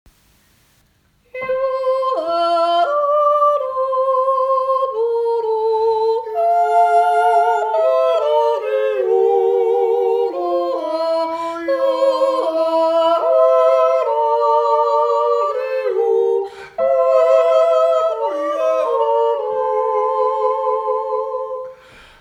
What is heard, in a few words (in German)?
Jodel